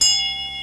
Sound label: bell